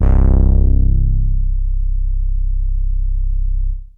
Bass Synths hits and octaves

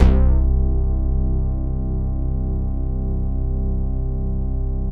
SYN BASS

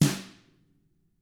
TAMA drums; ILIO; Double Platinum Drums 1

